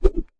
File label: kung fu staff, Kung Fu Staff Swings